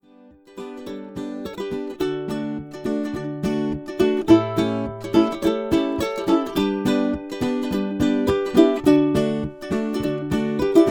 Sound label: UKULELE